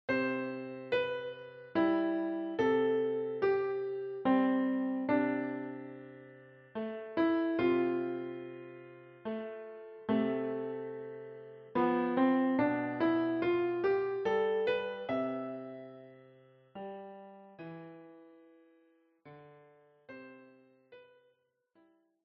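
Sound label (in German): Der Schwan, Klavier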